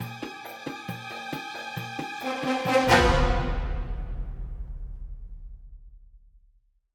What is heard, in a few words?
Suspense Endtag; Syria Castle